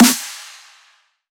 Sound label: dubstep club snare